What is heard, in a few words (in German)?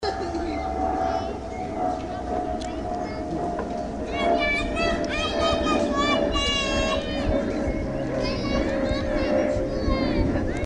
landeanflug